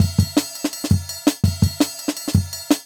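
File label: Jungle Breaks, 167 bpm; Original Jungle Breaks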